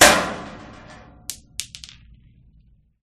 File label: Metal Marbles Sheet Metal Hit; Sound Spark